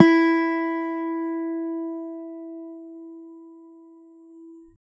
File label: a clean dry audio recordi, melodic